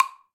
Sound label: Wood Block Low; Latin Percussion; Drum Hits; Ableton; Factory Packs